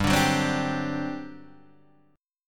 G Major 7th Suspended 4th, G, down, Guitar, Standard; GM7sus4 strummed; JGuitar